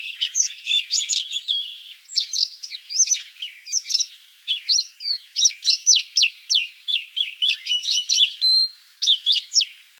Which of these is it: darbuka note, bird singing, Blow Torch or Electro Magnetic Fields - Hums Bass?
bird singing